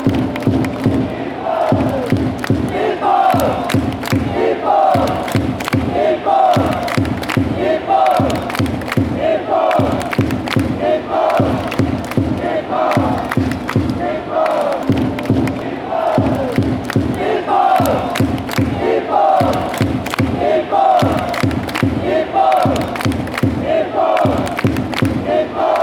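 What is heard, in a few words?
drums, football fan chants; japan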